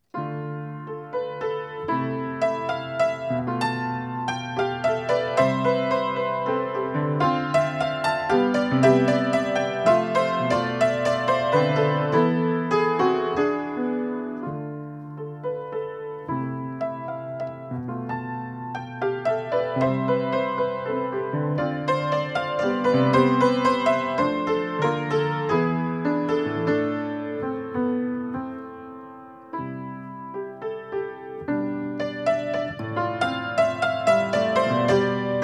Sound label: menuetto; novak; suita v starom slohu; hudobna vychova 8